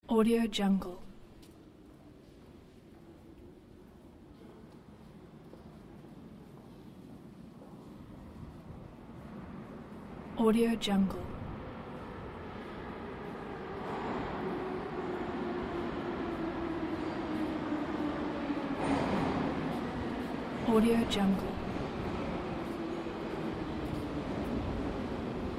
metro trains; stasknop

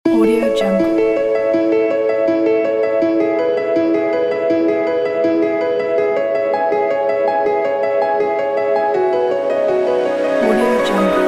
cinematic documentary music; mkj production

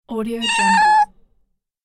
meowing

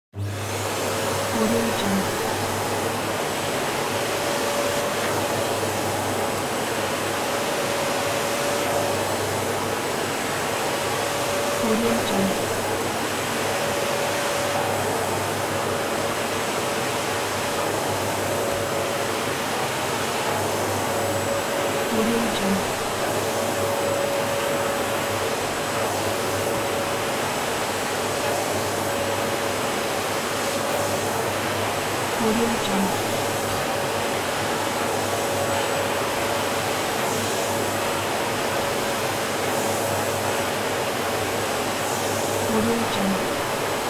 vacuum cleaner

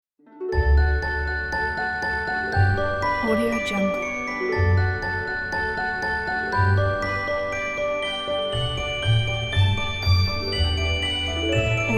charm logo; arsenmanvelyanmusic

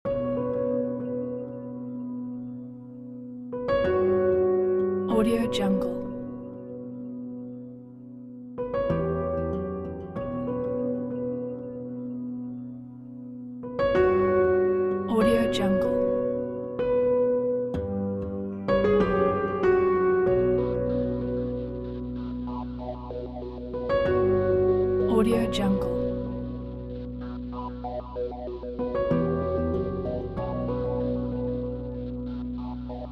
scary haunting piano horror film music

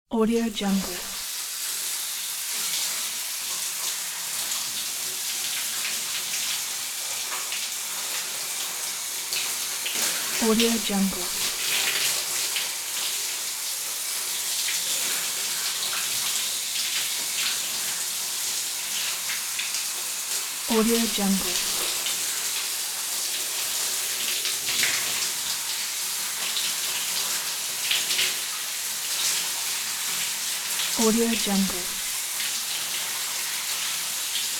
shower sfx

